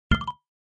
Button click error

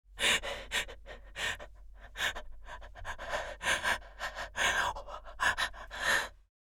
Mature woman rapid breathing

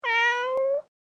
Stressed cat wail; animal

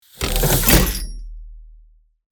Heavy mechanical movement

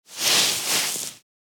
Nylon rustle movement; foley

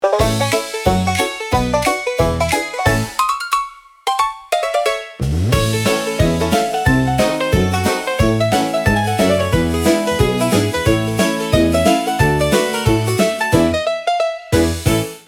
Western slot machine casino win combination

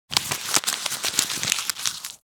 Paper sheet crumpling rustling

